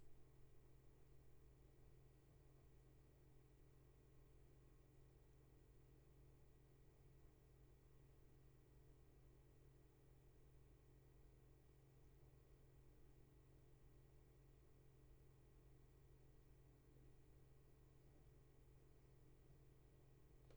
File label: Room tone